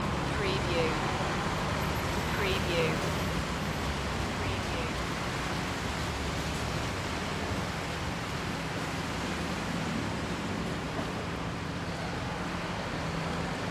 Traffic Street Crossing Heavy Traffic Rain Summer Day